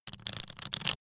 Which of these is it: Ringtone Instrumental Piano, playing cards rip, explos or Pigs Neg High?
playing cards rip